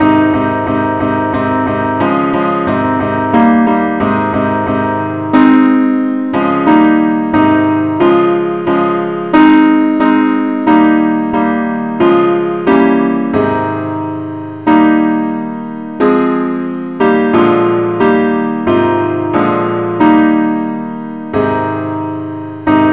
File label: simple acc key aes; Father, we praise Thee, now the night is over; christe Sanctorum